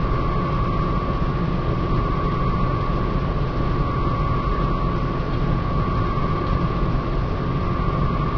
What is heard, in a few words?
fan loop, ambient tones; Source Engine; cstrike